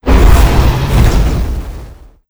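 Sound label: boss dragon spawn, monster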